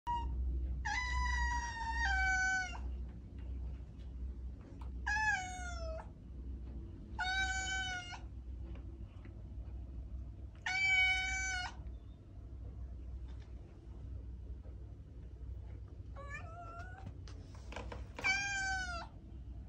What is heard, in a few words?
Cat Noises